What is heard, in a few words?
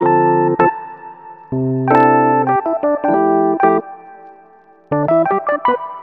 keys, phonky loops